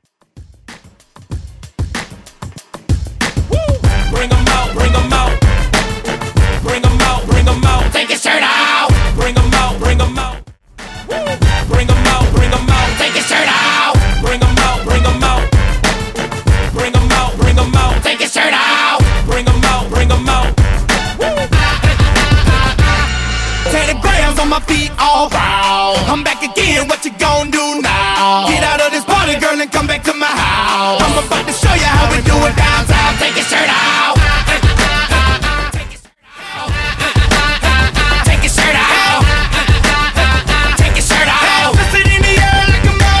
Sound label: Hiphop Redrum